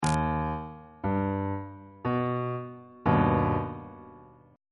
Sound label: e flat augmented chord on bass clef